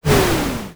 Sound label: archery whoosh